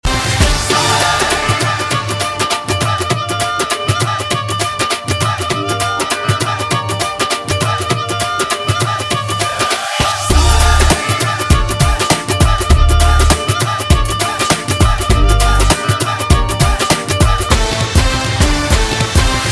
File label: Bhangra